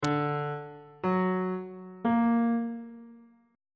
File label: c double sharp augmented triad chord intervals on bass clef